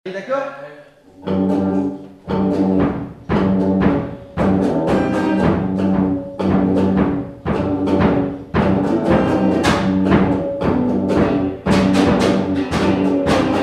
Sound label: rock n'roll